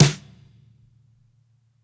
SNARE MED; Basement drums vol 2

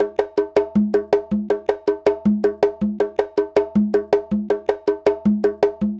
Conga, Latin Percussion

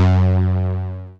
505, String Machines

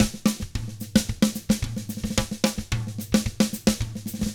SMBA, 110 SAMBA, Drums

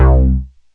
AX60 BASS; Rubber Chicken Software Sample Bank

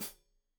Hat Foot, Hi-Hat, Cymbals Stereo

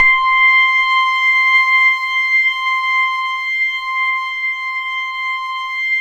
KT76, Synthesizers

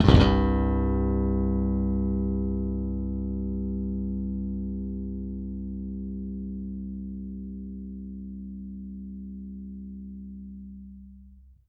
Slap One Shot CG Long, Bass; Bass X

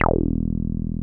303 E2 f; Drums In Your FACE!

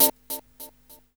FX, Grunge Hip Hop; USB Soundscan vol.34; Burning Grunge Hip Hop; AKAI

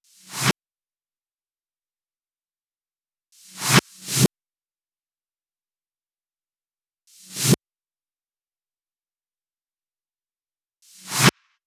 Drum Loop FX, 128BPM; VEC5 Drumloops; VEC5 Multi Loops 128BPM; Loop Elements

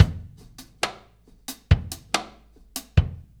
Earth Loop, Drum Tools, Laid Back

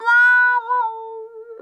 SPINDLY, Funk Guitar; Zero G - Funk Guitar

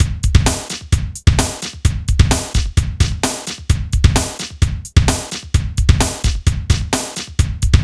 rock; INIS; Funky Xtreams

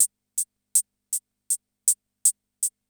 CR78; Best Service; XX Large Most Wanted

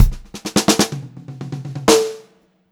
Pop-Rock Drumloops; 126 POP 4

